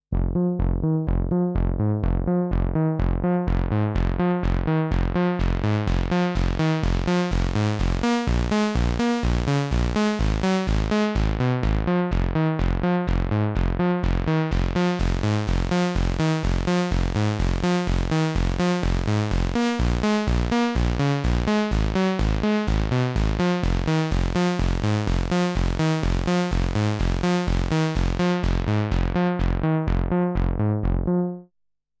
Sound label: Summit Audio TPA 200B Synth Medium Overdrive